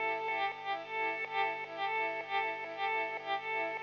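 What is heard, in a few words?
electric guitar, ethno, 125, A; eJay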